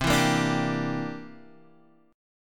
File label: C 9th C down Guitar Half Step Down